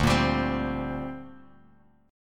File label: D 7th Suspended 4th Sharp 5th down Bass Standard